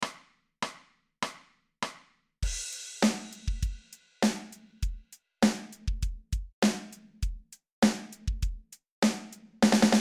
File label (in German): SOLO mit Einzaehlen 100 bpm, drumsolos